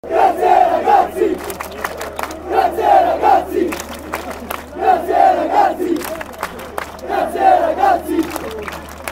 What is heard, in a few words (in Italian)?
Grazie Ragazzi, cori curva nord